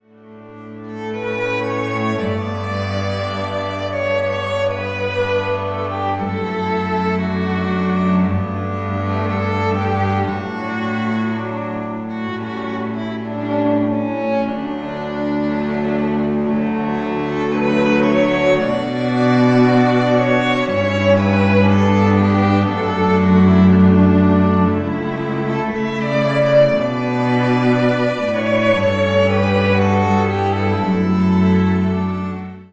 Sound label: String Quartet